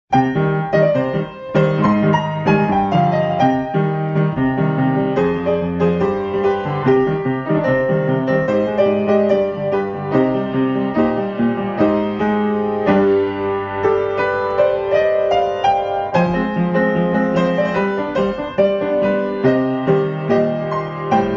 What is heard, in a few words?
piano; DELTARUNE Chapters 3+4; kris; rouxls